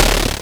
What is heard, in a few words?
Helecopter Snare, MultiMoog